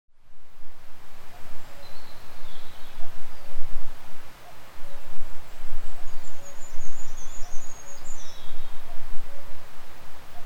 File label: cuckoo; across the loch; Highlands; life in the Highlands